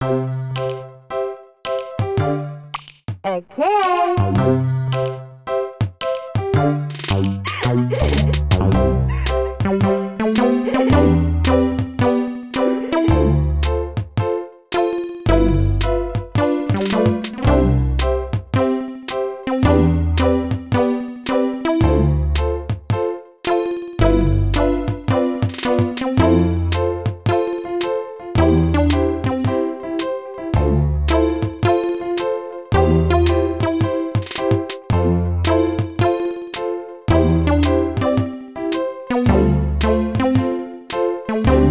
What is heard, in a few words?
Doodle Woods - Ending Mix; Wario Land 4; GBA